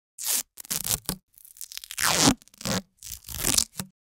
duct tape rip

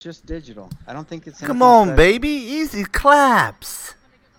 easy claps

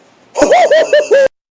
very funny laugh

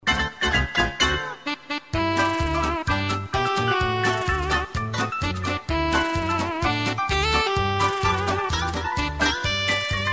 hbd instrumental

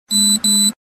active reminder; axon

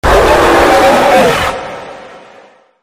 cartoon cat roar

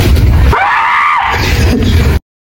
mega scream

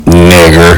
button

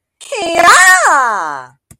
he yaaaaaa

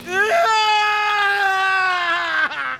scream; carl fredricksen

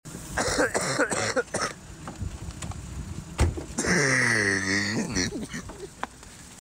car wheeze long

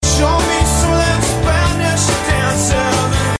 Spanish dancing

